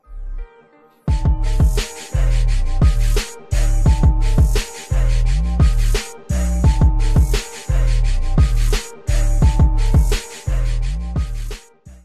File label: Instrumental